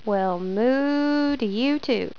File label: moo